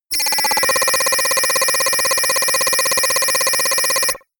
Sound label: notification data transfer aggressive